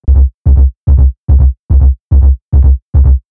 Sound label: Dirty Percussive Sub Bass